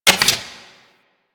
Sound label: Fist Movement; Enemies; DE; Warframe; Tyl Regor; Fists